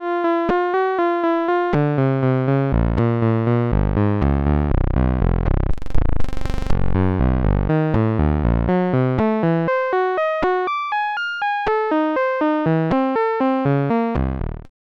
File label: Noise input; Snazzy FX; telephone game; SUM output